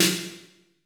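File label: MIRROR Snare; Masterbits - Soniq Elements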